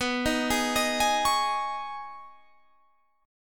B 6th Add 9th B down Guitar Half Step Down

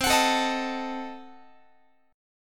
C Diminished 7th down Guitar; Cdim7 strummed

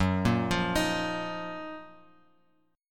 F sharp Major 13th Bass Standard; arpeggiated; JGuitar

